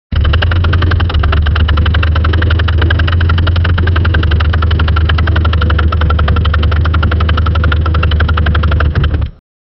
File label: old car engine cranking